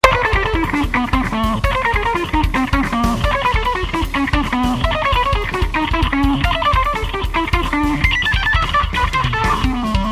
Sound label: Jazz Guitar; Ueberschall Jazz Guitar